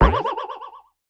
Transmission Gear Lose; Yu-Gi-Oh! Master Duel

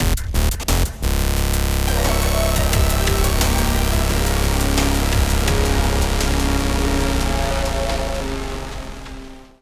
jingle boss appearance; Namco Museum Arcade Pac; pce2

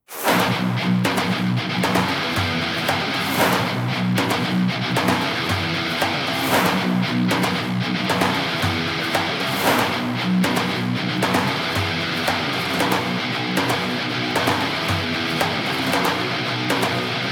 Boss Fight; prince of persia the lost crown gamerip 2024

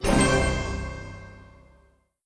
Arrive; Indiana Jones and the Infernal Machine

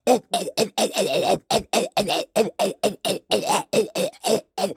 Flukeman bottom loop

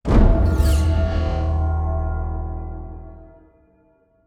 Level End

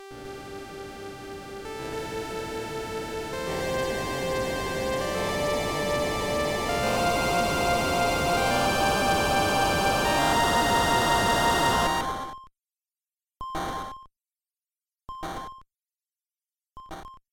Distortion End; Teleroboxer; Virtual Boy

